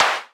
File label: perc, Drum Machines, Clavia Nord-Drum; 300 Drum Machines